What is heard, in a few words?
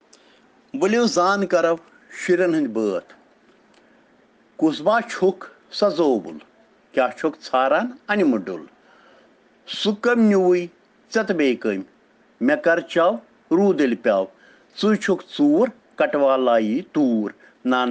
Children Rhyms in Kashmiri